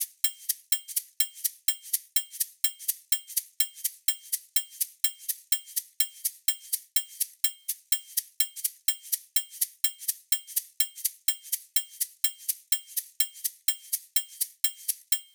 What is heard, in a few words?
hat loop 125, Drums; Pop; Hat Loops; Slate; SD PASADENA Pop Sample Pack